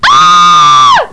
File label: SCREAM; Carmageddon Demo